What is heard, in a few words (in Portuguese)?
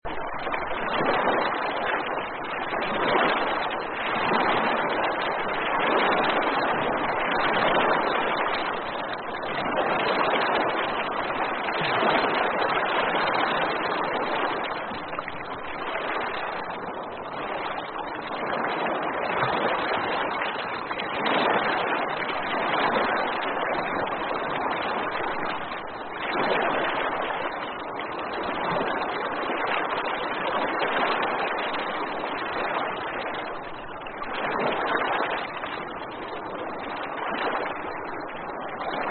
ambientacao